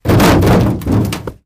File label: Body Falls Car Hood